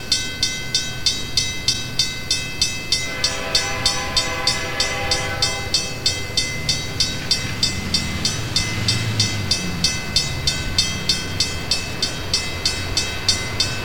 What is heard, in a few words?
GP38-2, horns; ex-LIRR 259; llpx2248